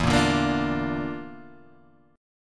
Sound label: Bb Major E; Bb/E strummed; JGuitar